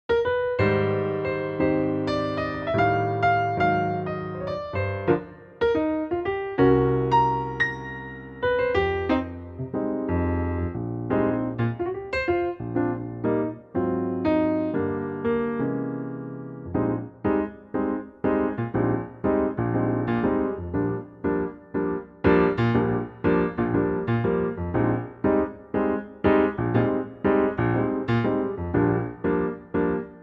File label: piano